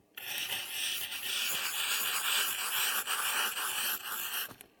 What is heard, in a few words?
Pencil Drawing Circles